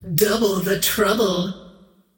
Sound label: scream; queenofpain